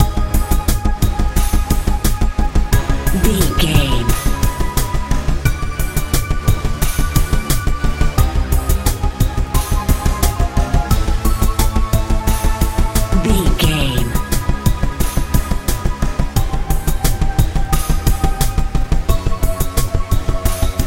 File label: modern pop